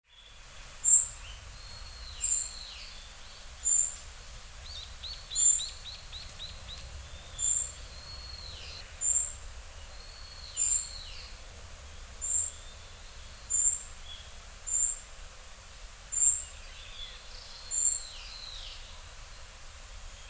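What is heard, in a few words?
kibitaki, Narcissus Flycatcher; hyogo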